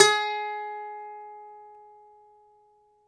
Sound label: DOMRA, Russian Strings